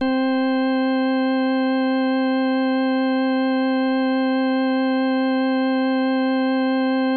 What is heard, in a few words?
ORG C, ORGAN B3 HAM; Best Service ProSamples vol.55 - Retro Sampler; AKAI